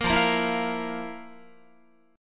F Major 7th Suspended 4th F, Guitar; strummed

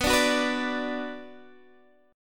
B Minor B Guitar Open D

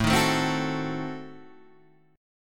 A Diminished 7th A down Guitar Half Step Down

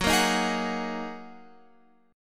F sharp 6th Add 9th F sharp down Guitar Open F; F#6add9; strummed

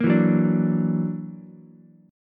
Gb Augmented 7th Gb down Ukulele; Gb+7 strummed

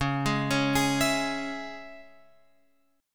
C sharp Minor, Guitar Open F; C#m; arpeggiated; JGuitar

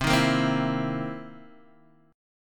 C Minor 7th Flat 11th, chord; Cm7b11 Chord {X 3 1 3 1 0} strummed

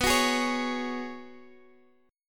Gb Suspended 2nd Suspended 4th B

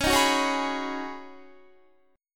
Db 7th Suspended 2nd Suspended 4th Db Guitar; Guitar-4F,4A,5C,5G,5C,6E; Db7sus2sus4 strummed

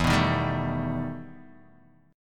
E Minor Major 11th E Guitar Open D

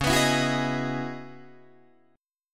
C sharp Minor 11th C sharp, Guitar DADGAD; C#m11 strummed

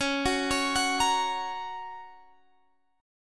A sharp Minor Sharp 5th

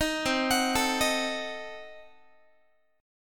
C Diminished 7th down Guitar; Cdim7 arpeggiated

